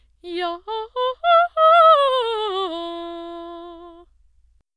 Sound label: singing Anechoic